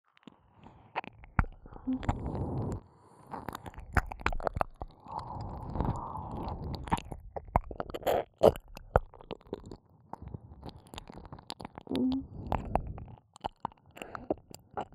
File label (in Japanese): ねっとり左耳舐め; 耳元ループ; おまけループ（オナサポ）